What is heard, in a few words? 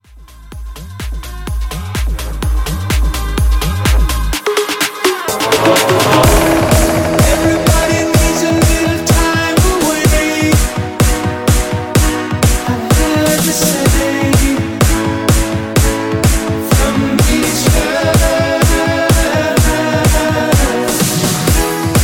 Future House 126; Rage Edit